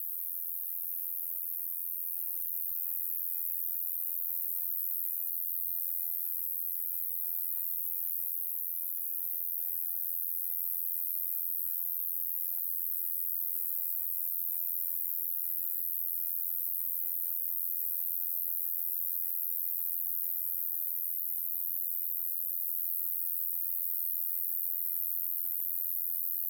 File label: Single Cricket Night Countryside Italy; justsoundeffects; Crickets and Cicadas